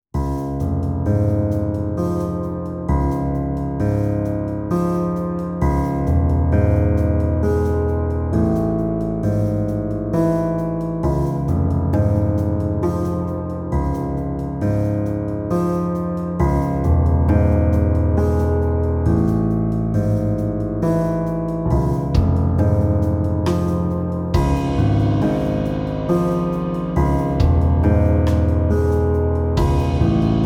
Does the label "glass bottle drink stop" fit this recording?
No